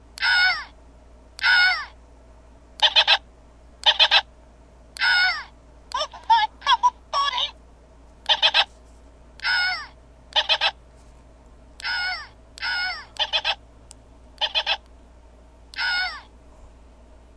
stupid happy meal toy only does this

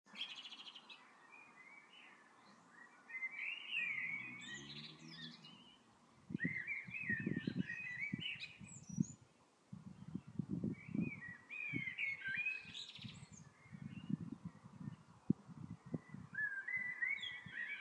birds singing outside

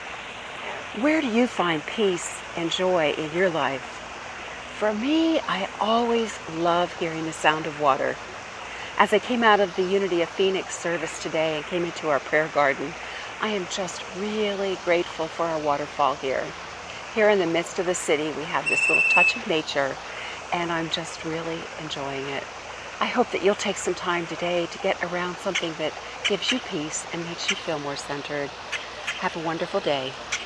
peaceful sound of water

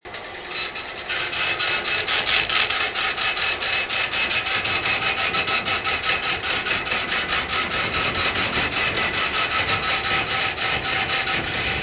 bandsawing new speaker grilles

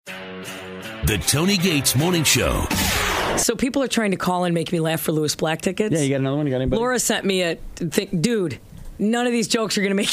laugh

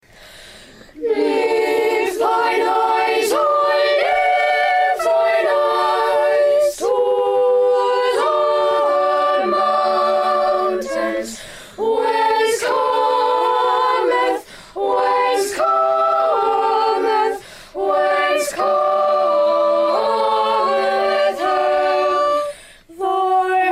cathedral choir; worcester; on bbc guernsey

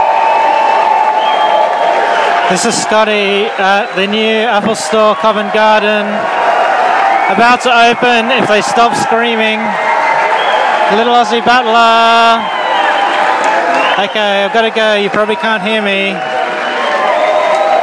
Apple store Covent Garden

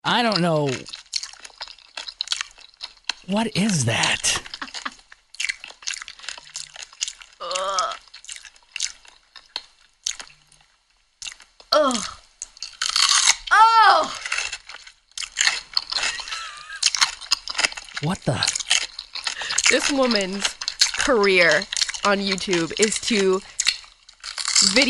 cringeworthy mouth noises

